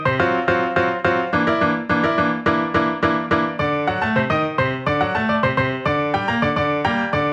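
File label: salsa G minor PIANO; multitracks; G menor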